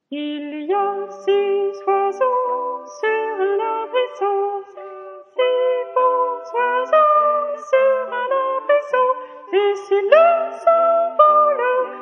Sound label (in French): sopranes; Oiseaux